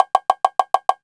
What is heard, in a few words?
M Bongo Tone